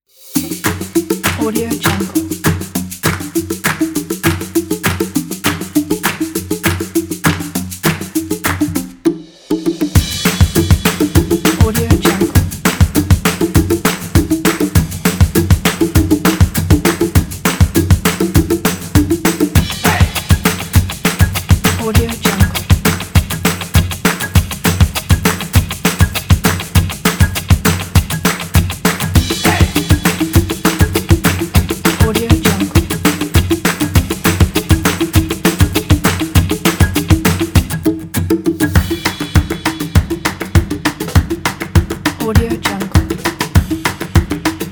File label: upbeat acoustic percussion